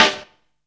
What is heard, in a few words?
gut, snares; Zero-G; Funky Elements